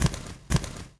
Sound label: walk